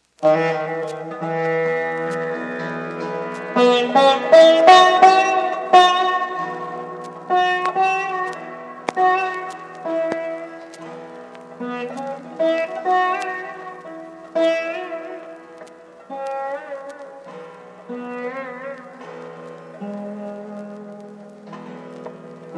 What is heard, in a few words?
sitar dance